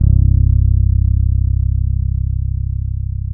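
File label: C 2, warm fretless, bass; Spectrasonics; Bass Legends CD 1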